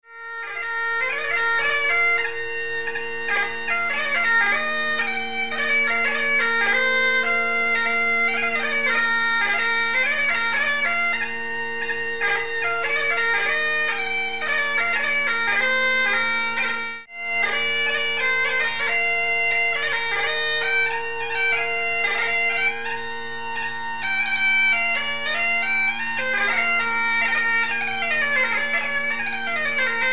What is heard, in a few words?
Bagpipes